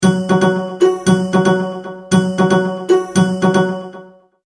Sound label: Multimedia Tone-Thinking/Processing